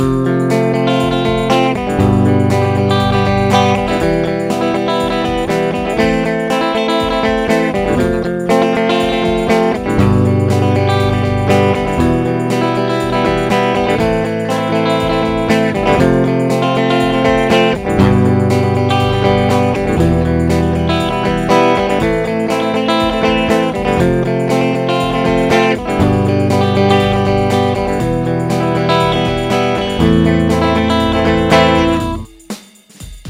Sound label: arpeggio original